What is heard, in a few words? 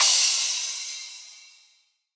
soft hit finish